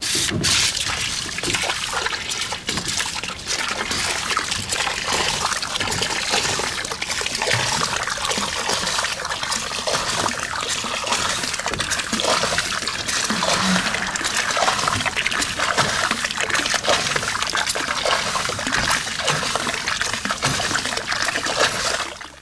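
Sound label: water pump; WAV Sound Effects; houshold; utility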